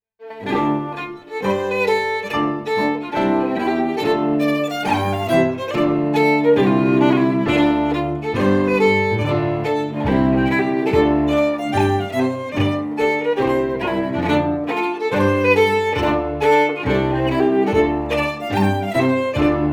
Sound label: FIDDLE CELLO PIANO; Jink & Diddle Book o' Tunes 2021